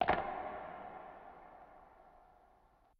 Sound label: Weapon Racket; Going Under